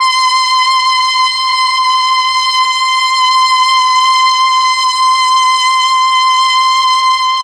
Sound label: MEL STR, Legendary M400; ILIO; Propeller Island